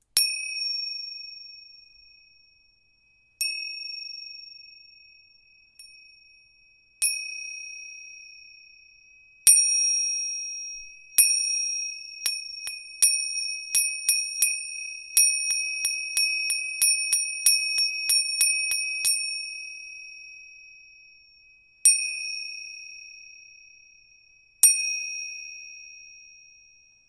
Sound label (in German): zimbeln klein